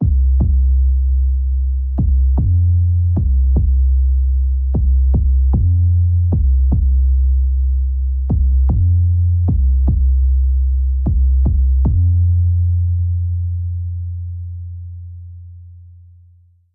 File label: Drums